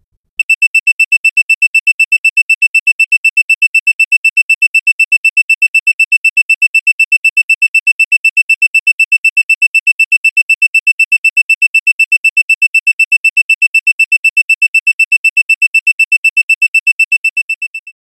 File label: Alarm Beep Timer; hi-tech-various